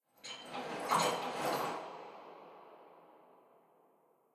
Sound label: enter neutral phase